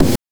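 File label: BRK BD, bass drum; NES DPCM; MIDINES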